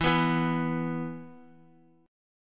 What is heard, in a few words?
E 5th; strummed; JGuitar